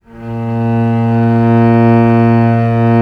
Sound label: A#1, Historical Instruments, VIOLE; VIOLE 2V; AKAI; USB Soundscan vol.23